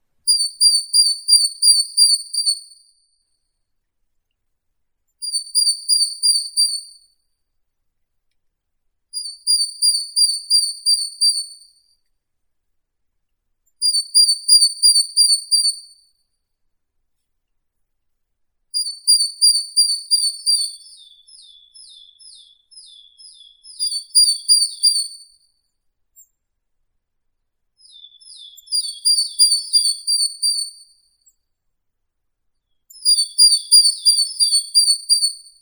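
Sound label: great tit parus major; sinitsa bolshaya; zvuki prirodi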